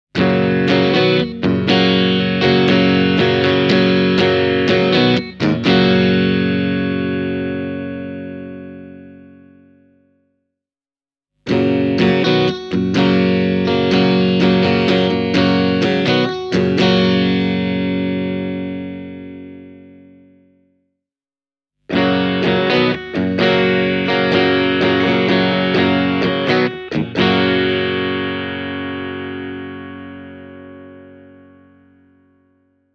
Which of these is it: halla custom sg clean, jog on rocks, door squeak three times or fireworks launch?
halla custom sg clean